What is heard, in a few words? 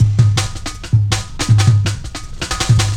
Drum Loops, Jungle